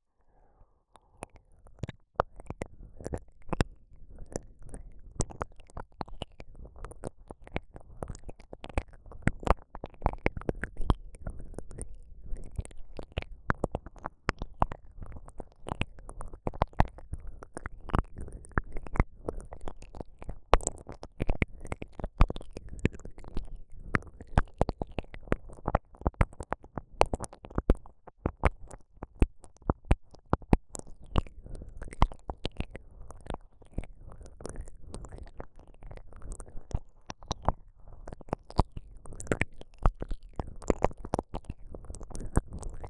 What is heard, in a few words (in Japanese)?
黒耳 右耳 擦られて気持ち良い; Free Space ProII